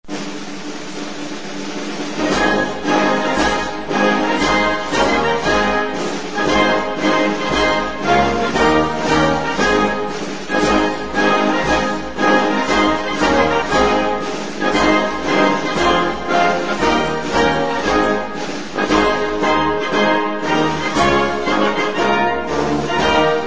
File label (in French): hymne tunisie